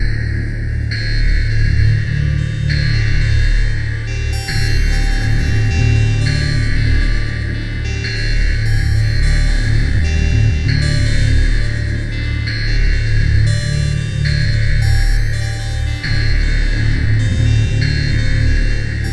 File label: Window view loop